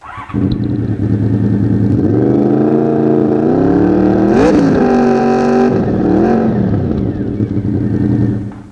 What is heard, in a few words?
vfr staintune, motorbikes